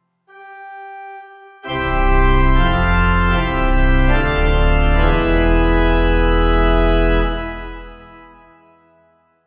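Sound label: Morning Service Collect Amen Pipe organ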